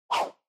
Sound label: Motion Swish Single; モーション スイング; 単音